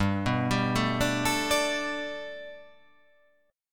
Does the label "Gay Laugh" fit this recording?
No